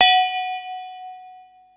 mallets

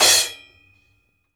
CHOKE, CRASH; Sampleheads; New York City Drumworks VOL-1; SP CRASH

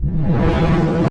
Impact Wipe, Cinematic

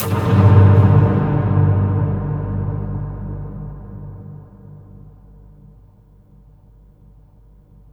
pit hit; Spectrasonic Distorted Reality 1

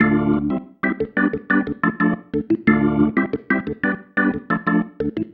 Pattern, Organ

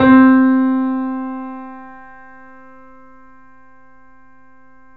C, Piano; InVision Interactive; The Piano

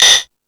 Found Sound, E-MU Pro-Cussion

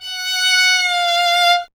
STR F#5, Solo Instruments Vln; Miroslav Vitous

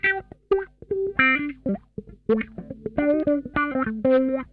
HIDE N SEEK, Funk Guitar; 90 s Sample CDs; Zero G

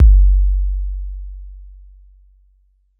kick machine

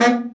BRA BARI, Bariton Sax

